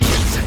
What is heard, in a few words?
confirm